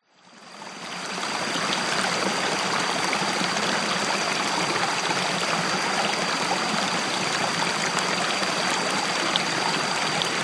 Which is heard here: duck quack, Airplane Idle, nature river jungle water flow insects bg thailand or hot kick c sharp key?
nature river jungle water flow insects bg thailand